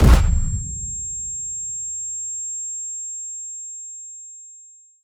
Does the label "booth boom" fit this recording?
Yes